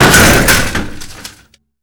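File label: destroy filecabinet metal; Call of Duty Modern Warfare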